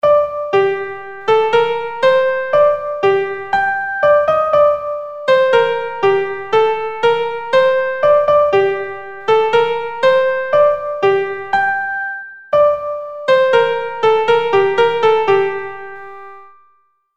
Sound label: shropshire union melody